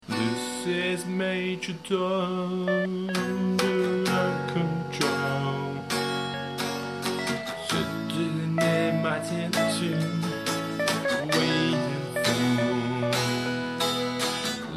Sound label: sad major